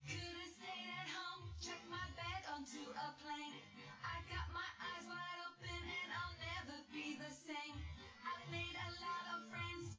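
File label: with drums, synth conv, 250ms, 5cm; SiSEC10; underdetermined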